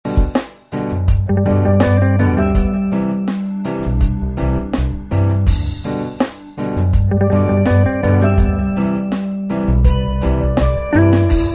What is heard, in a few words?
Relaxing and Calm Romantic Caribbean Loop